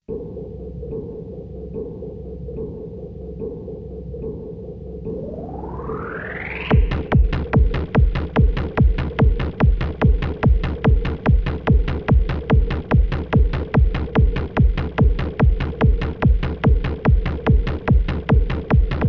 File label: Trance, Vocal Mix